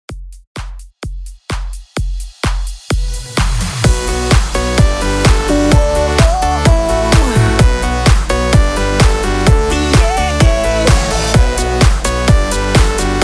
REDRUM 128 BPM

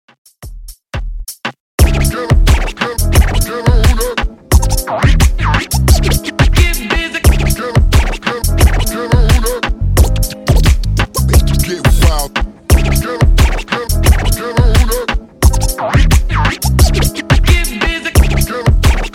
Dj Intro Outro 88 Bpm

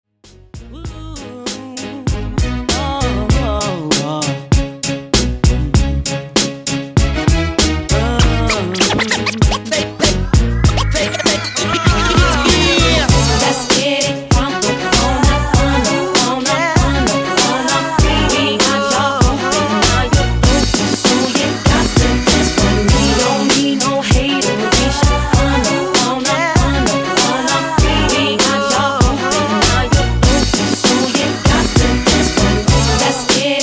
Party Mashup 98 Bpm

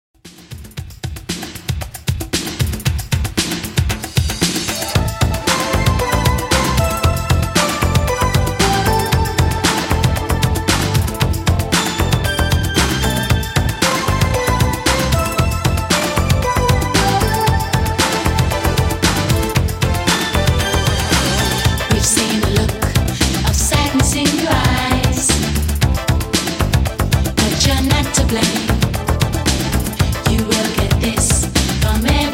80s 115 Bpm

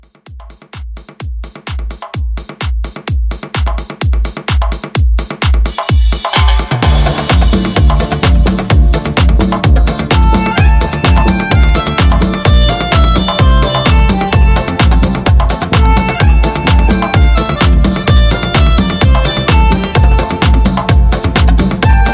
Re Drum Intro 128; Dainjazone; 2023 Reboot

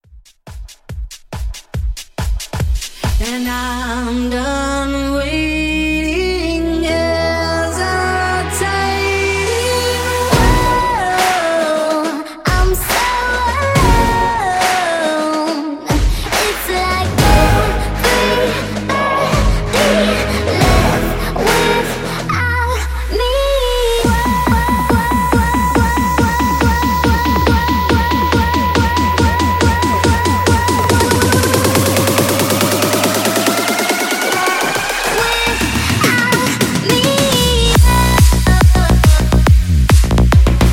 Future House Rework; Remixfreakz

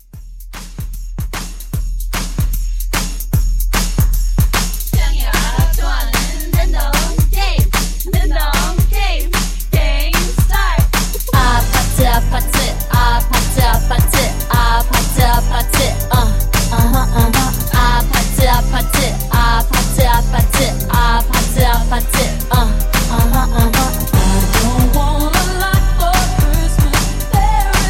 Christmas Quick Mashup Intro

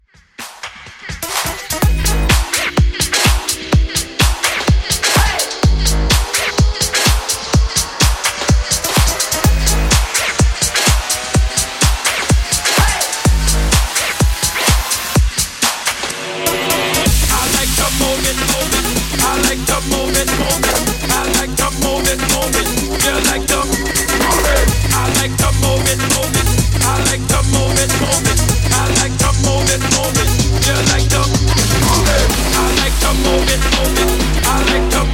bootleg 126 bpm

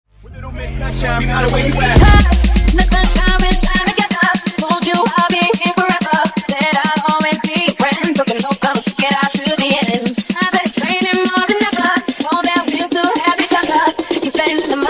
Funk Redrum 126Bpm

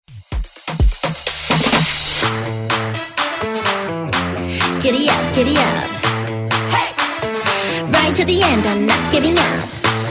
Afrobeat Kpop Redrum

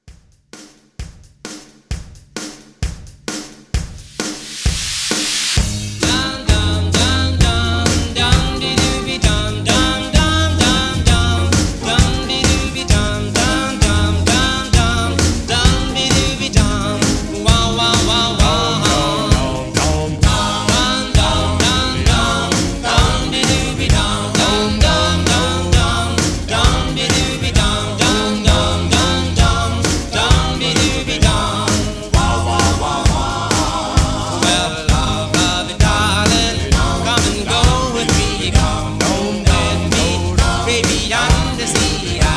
50s Re-Drum 131

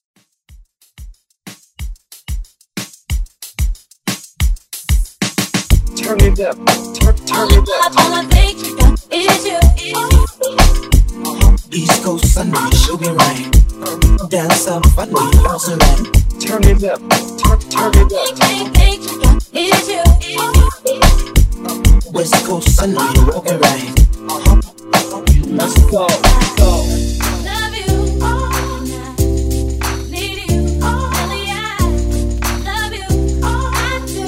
Dancehall Hype; Redrum

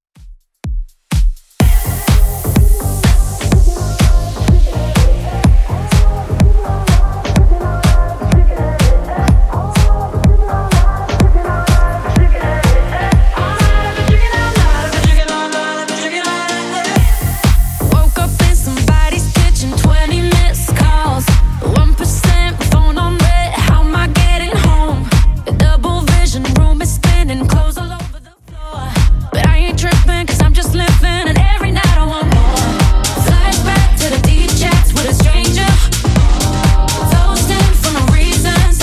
Top40 DJ Friendly Redrum 125bpm

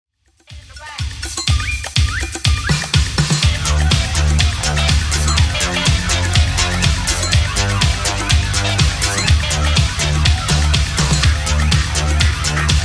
80s Redrum 123bpm CLEAN

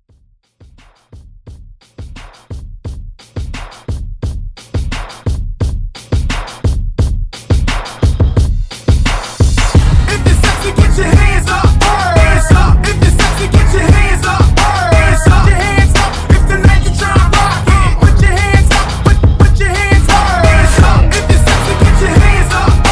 Hype; Redrum

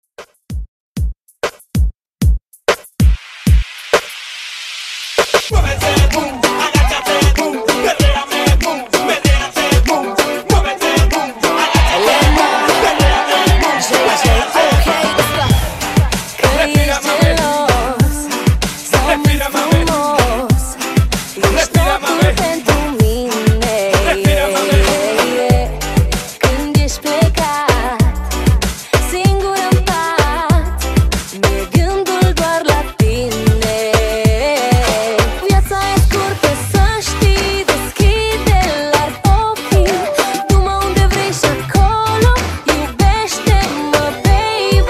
Latin Re-Drum 96 BPM

